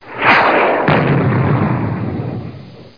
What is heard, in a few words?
EXPLOS; Gunlok; environ